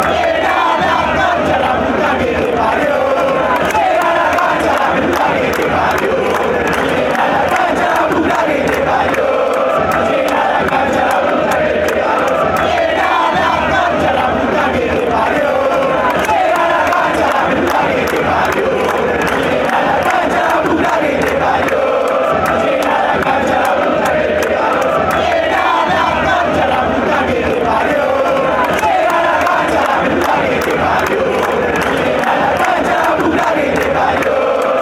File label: fill stadium, chants